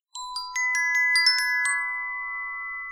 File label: denoising glock 0dB mmse